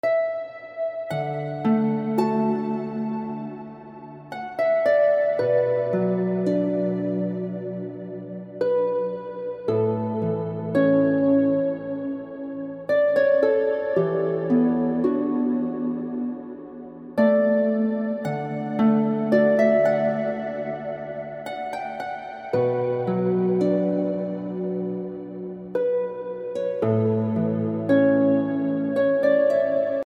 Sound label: heavenly harp music, Relaxing Music